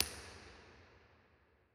Early Reflections Close R; St. Saviour Jerusalem BA; Impulse Responses; Ableton Live 12 Lite

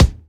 Kick; Ableton Live 12 Lite; Core Library; Drums

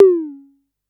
Tom Very High, Drums; One Shots

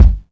Kick Blue Room

